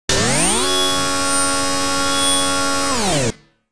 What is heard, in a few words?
Chainsaw Sound チェーンソーの音; Elle; pc-98